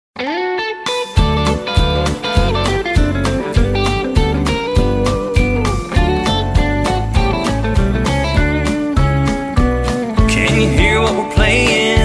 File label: Rockabilly Christmas